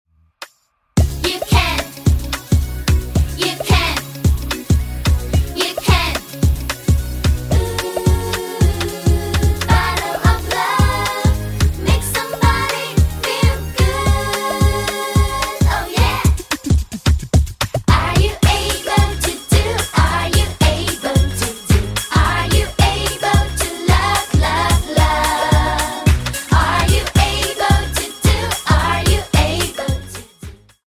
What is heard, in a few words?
Karaoke